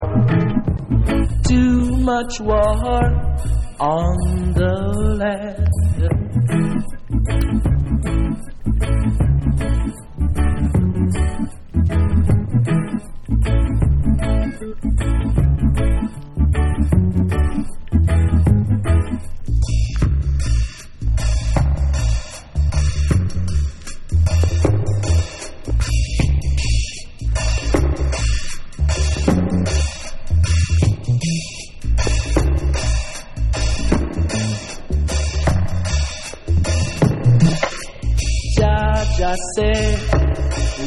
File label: Dubbing